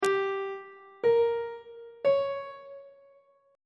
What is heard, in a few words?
f aeolian chord 2 is g diminished; on treble clef